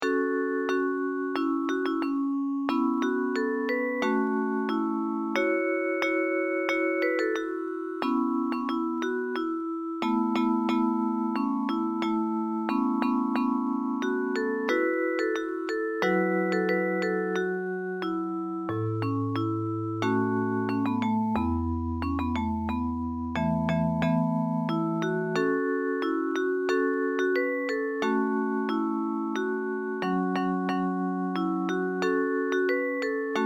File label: japanese folk song; noto mugiya bushi